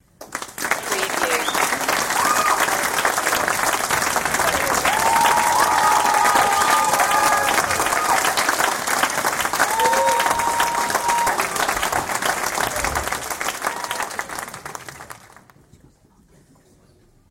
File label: Audience Applause; beanstalk audio